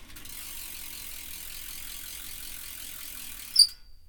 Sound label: bicycle; 1000fx